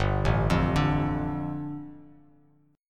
G 7th Suspended 2nd, Bass Standard; arpeggiated; JGuitar

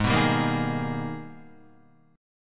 Ab Minor Major 9th, down, Guitar; AbmM9; strummed

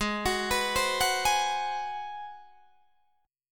G sharp 7th Sharp 9th, Guitar, Open D

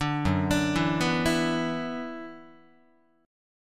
F sharp 7th Suspended 4th Sharp 5th, Guitar; 4A,4E,5A,5D,5Gsharp,6Csharp; arpeggiated; 5,2,5,2,3,3

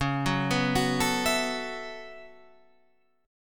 B 7th Suspended 2nd Suspended 4th, chord

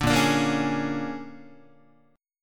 A sharp 7th Sharp 9th A sharp down Guitar; A#7#9 strummed; JGuitar

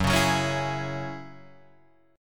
F sharp 7th Suspended 2nd, Guitar Drop D; 4,4,4,6,5,4

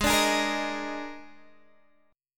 D sharp Major 7th Suspended 4th G sharp, chord

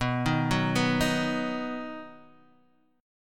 B Major B, Guitar, Half Step Down

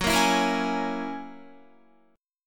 F sharp 9th F sharp Guitar; F#9 Chord; strummed

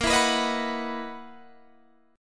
F sharp 13th A sharp

